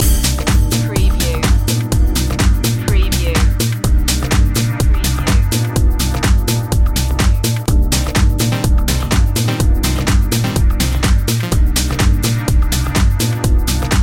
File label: pulse motion loop